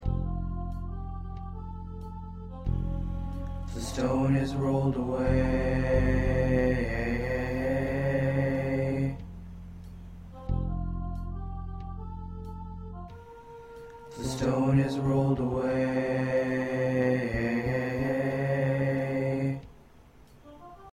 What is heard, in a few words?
2nd Tenor